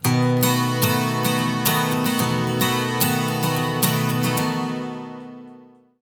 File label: 110 MAJ 12 STR, Acoustic Guitar Loops